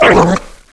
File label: zombie dog hurt; meatwall